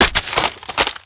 debris, ambience; ambient, ambience, rainscapes, rain; Left 4 Dead 2

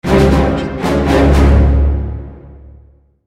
deathcam, music; Counter-Strike Global Offensive; valve csgo

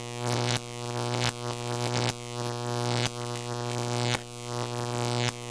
Electricity Spark; GrandFantasia

